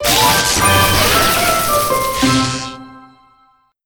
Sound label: Crunchy Finish